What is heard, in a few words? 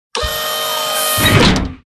Robotic Movement; SoundsCrate